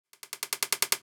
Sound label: Tech, Weird Side Samples